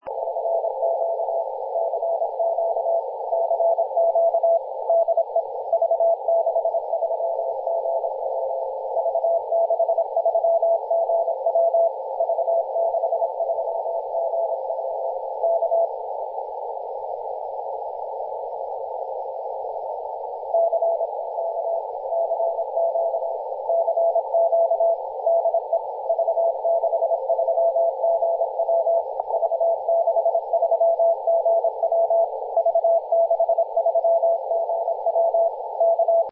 3.510 MHz CW CQing; v63gw

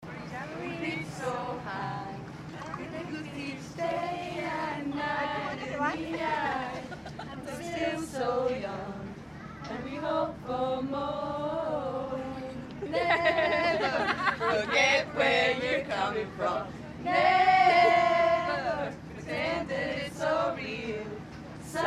fans warming up their vocal chords; outside Hampden

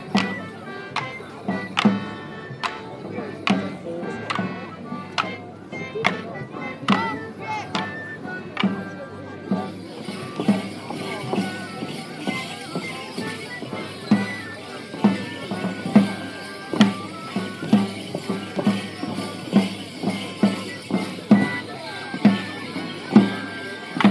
slow morris with whistle or fife

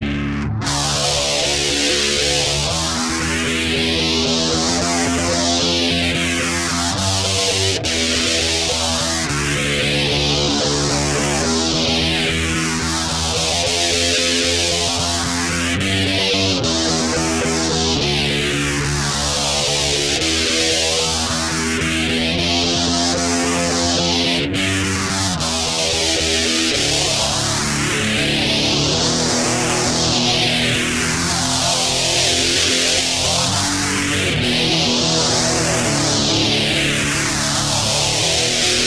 semi techno

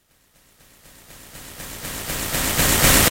microphone error

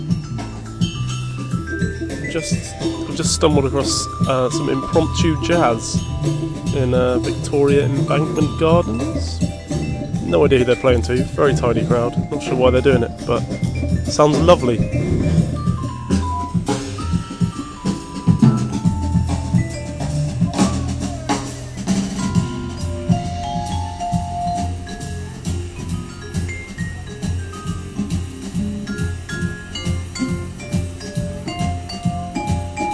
live jazz on a wet day

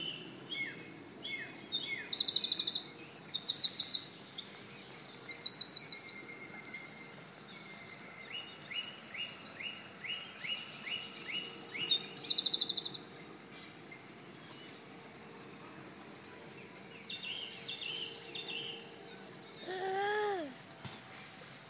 Sunday morning sounds on Peckham Rye

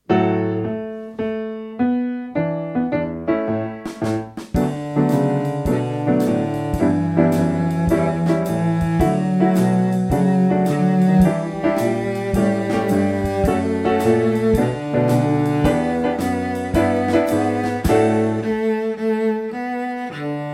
in the groove cello

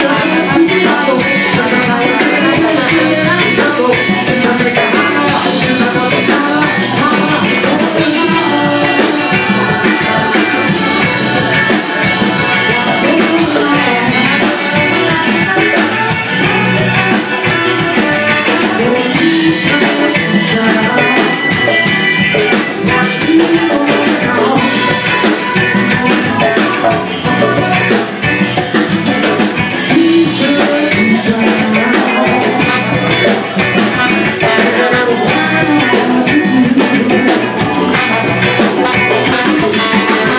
band in kilburn

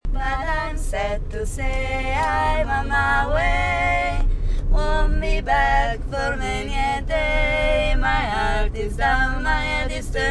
singing in the car

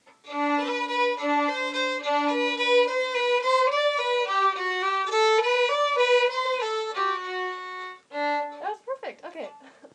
fiddle echo

